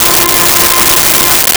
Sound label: Viola F4; Nintendo; July 2020 Gigaleak